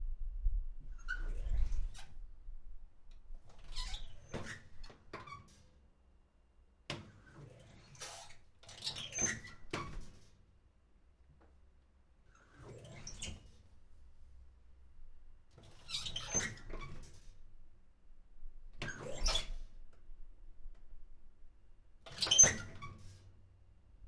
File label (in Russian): otkritie i zakritie dverci duhovki na kuhne skrip i skrip